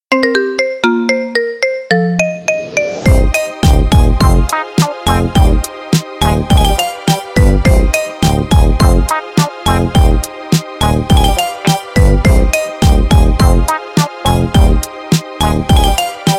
marimba remix

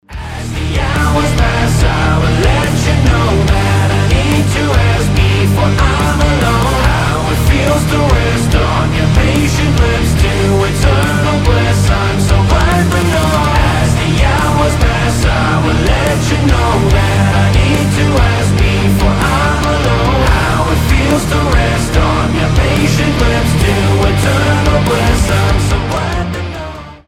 metal cover; rok metal

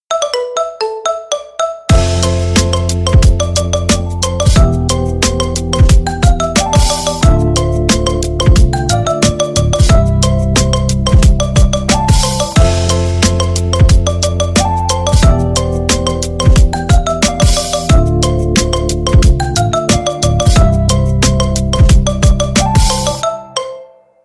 marimba remix

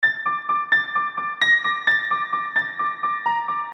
halloween piano keys; уведомление

